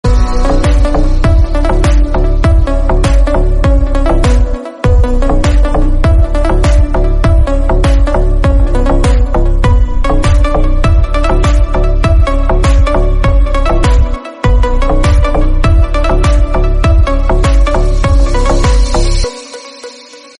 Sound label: remix arebic